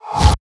ghost bag; smite; zhongkui

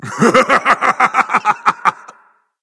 laugh berserk; cuchulainn

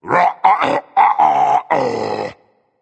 tongue berserk; cuchulainn

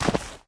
step; smite; chronos

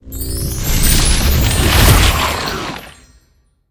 pwr activate; smite; tiamat

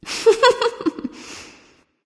heh heh; smite; voicepack

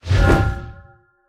swing; smite; atlas

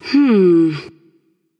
thinking; Smite; bastet

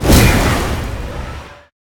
phoenix fire; smite; npc

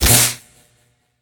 bite; smite; serqet

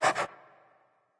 dog pant; smite; scylla

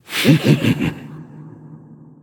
heh heh; cernunnos

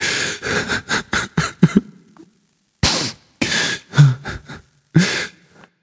boohoo; smite; voicepack; lancelot

